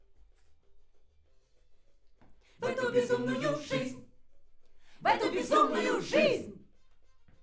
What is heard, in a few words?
Backs AKG LEFT